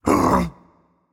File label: confused, voicepack; smite; jorm